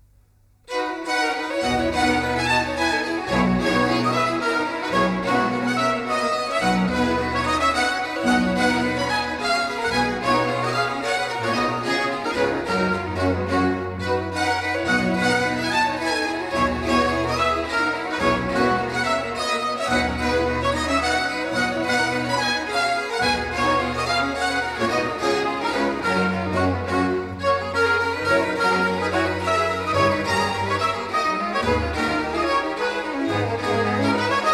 Orchestral Suite in C major, Forlane; Orchestral Suite No. 1; BWV 1066; J S Bach; 4 Orchestral Suites

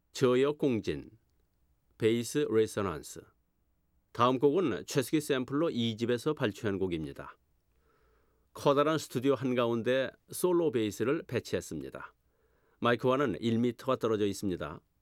Bass Resonance; The Ultimate Demonstration Disc; Introduction

